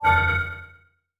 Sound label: targeting warning; LoL; hud